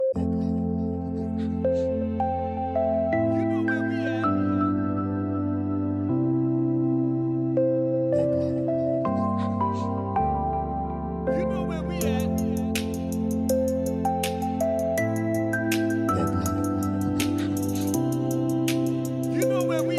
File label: POP EDM